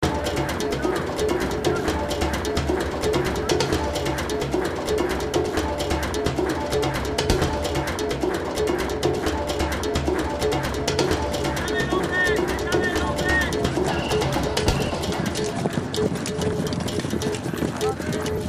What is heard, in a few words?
Argentina Loop